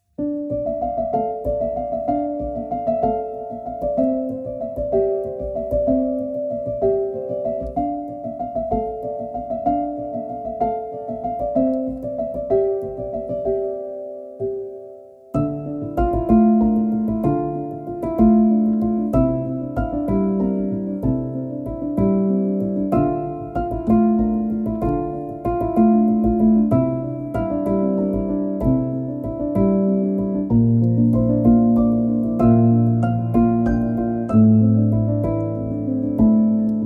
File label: meditation music; relaxation meditation